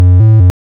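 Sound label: waveform FM triangle triangle silence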